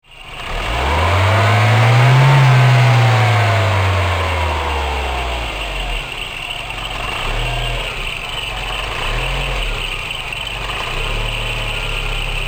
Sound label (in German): motor